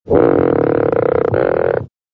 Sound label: frog croak; bugsbunny